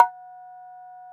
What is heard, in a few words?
Quinto Tone